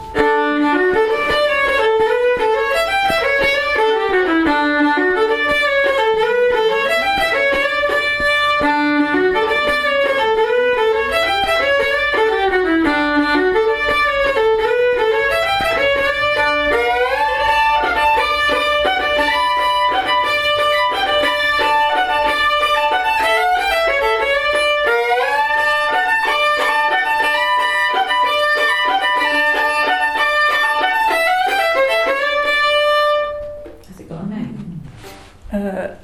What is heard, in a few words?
Waltz; Tune Swap; efter Carl Fanta